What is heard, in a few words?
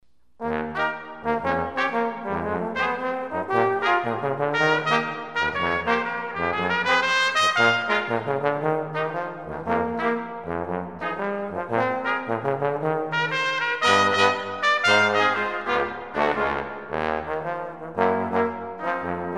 brass quartet